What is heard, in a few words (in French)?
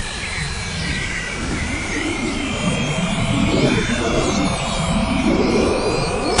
paysage